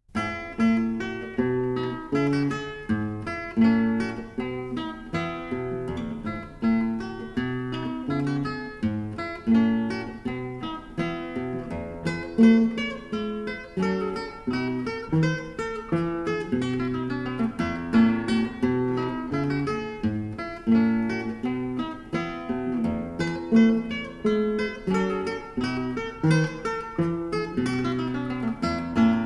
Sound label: Suite for solo cello in E flat major, Bourre; BWV 1010; Bach for Babies; Fun and Games for Budding Brains